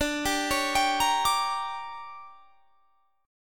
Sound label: D Major 7th Suspended 4th Sharp 5th; DM7sus4#5 arpeggiated